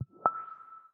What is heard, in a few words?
Wireless Charging Finished, LG K83-5G ui